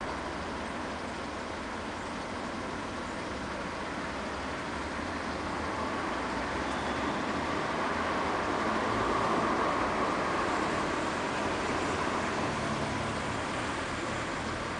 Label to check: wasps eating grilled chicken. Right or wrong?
Right